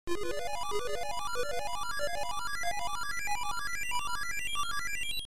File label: Title Beeper; Stifflip & Co